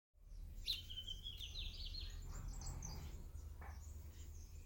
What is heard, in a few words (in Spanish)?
Corbatita Overo, Sporophila lineola; EcoRegistros